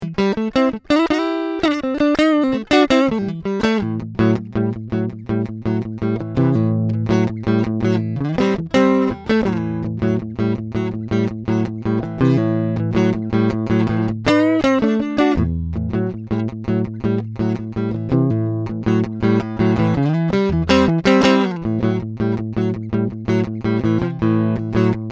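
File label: Hot Vibro King unprocessed; fender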